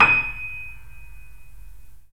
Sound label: 3D Pianos Yamaha C7; E-MU Producer Series Vol. 5